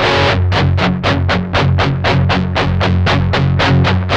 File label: 8TH G 2, Guitars; Spectrasonics; Hans Zimmer Guitars VOL-1; 128-JACKHAMR